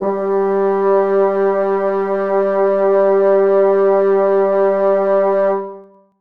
bassoons sus g3; Sonatina Symphonic Orchestra

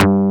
SBASS; akai; mpc1000; factorypresetsamples

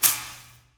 shaker lo; Percussion; Sonatina Symphonic Orchestra

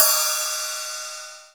RIDE

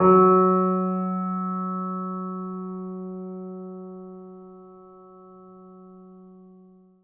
piano p f#3, Grand Piano; Sonatina Symphonic Orchestra

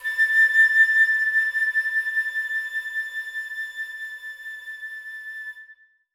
flute a5; Sonatina Symphonic Orchestra

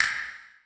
castanets, percussion; Sonatina Symphonic Orchestra

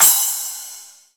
CRASH

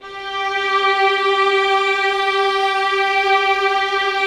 1st violins sus g4; Sonatina Symphonic Orchestra

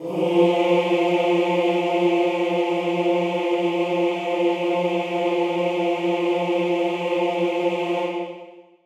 chorus male f3; Sonatina Symphonic Orchestra

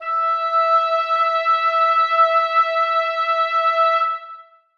oboes sus e5; Sonatina Symphonic Orchestra

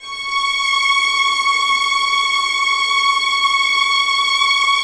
1st violins sus c#6; Sonatina Symphonic Orchestra

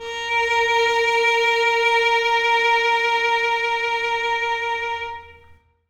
violin a#4; Sonatina Symphonic Orchestra

